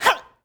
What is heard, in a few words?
Boxing Female Punch Breath